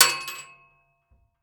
Weapon Bullet Shell 7MM Dropping On Metal; AdobeCC

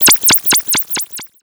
Title Transition Delay Short

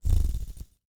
Technology Electronic Servo Motor Rope

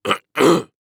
Human Male Clear Throat Grunt

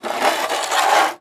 Weather Snow Shovel Drag Snow On Cement Light; AdobeCC

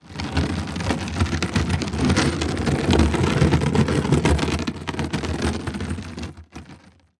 Industry Cart Roll By Metal Wheels

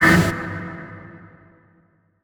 Transition Blast Short Hit; Adobe; Production Element

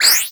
Multimedia Internet CD-Rom Flash Whoosh Short

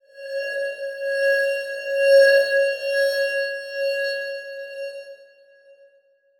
Transition Bell Warble; Production Element Title Transition

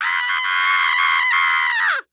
Cartoon Human Male Scream High Pitched

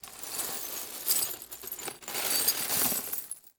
Crash Glass Debris Glass Scraped In Pile; crashes; AdobeCC

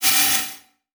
Multimedia Internet CD-Rom Flash Element

